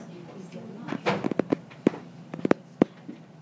tapity tap

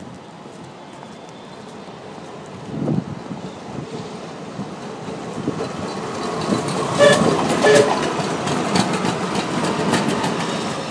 sound of approaching tram tooting

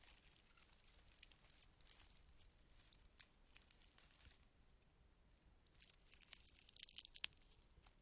rustling of rushes

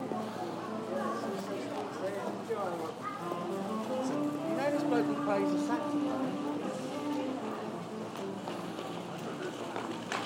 ipswich city centre busker

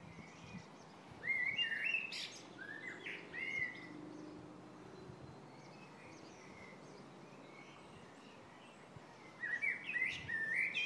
blackbird motorbike

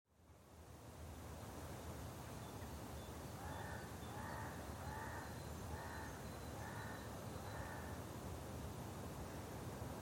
an apple gathering the orchard year soundscape

